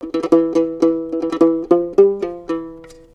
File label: Egyptian Dance